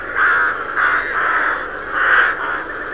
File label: Corvus frugilegus